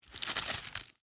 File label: Rope Grip Twist, Handle, Heavy, Rope; Hand Tools; BlastWave